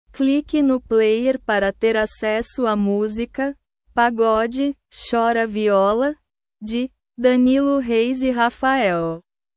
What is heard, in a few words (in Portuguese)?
pagode chora viola